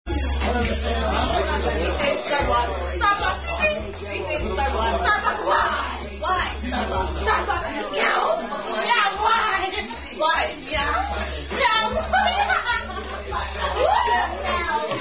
Thumper, Signs Indian Drinking Game While Standing